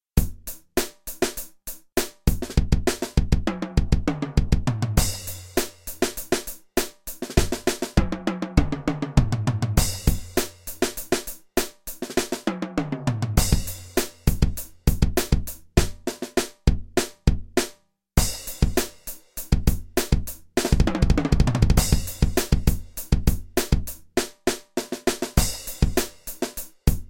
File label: FUNK Beats